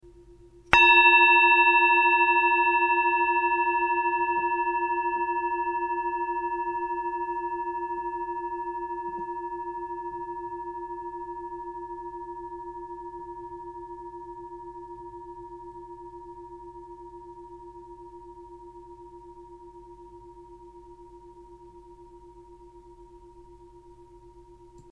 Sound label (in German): Klangschale